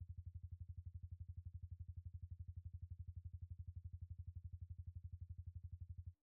IR LFE; Holophone; Brahma

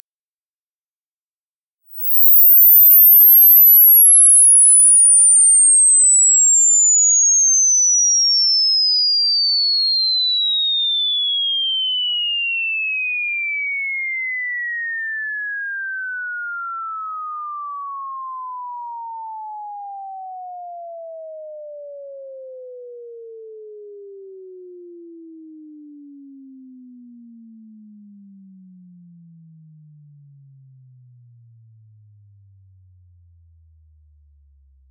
Inverse Sweep

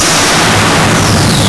Impulse Responses, Right Up; Ambiovolver

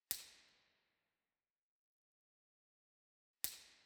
Musikverein, Theatres; Point02

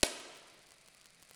Impulse Response MLS ESS

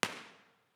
IR petardo orchestra; Teatro Benevento

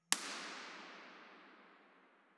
IR sweep; Bergamo; Frazier; IMP-RESP

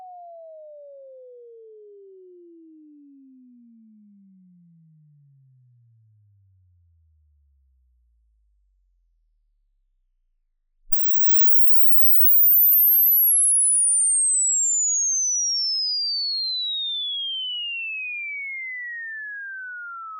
inv sweep; Kirkeby

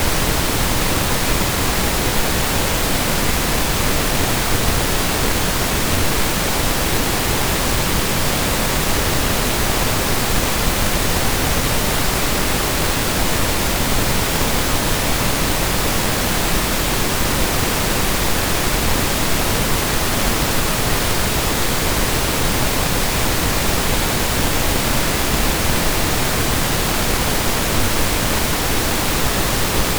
Pink Noise

